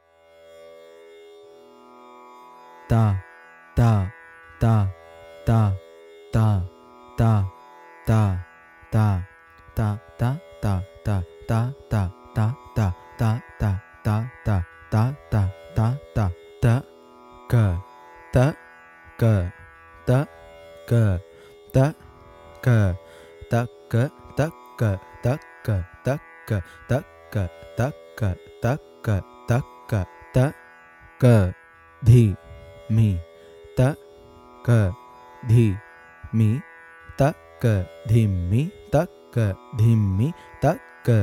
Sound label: Carnatic Violin Reading Skill; Grade 2; SaPa